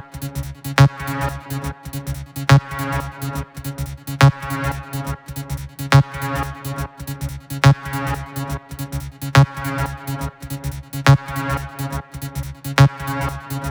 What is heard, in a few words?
140BPM C#; Vengeance - Essential Clubsounds Vol.4